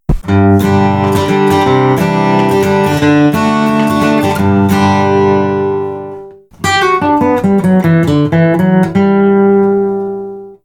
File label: Celluloid Picks TSelluloid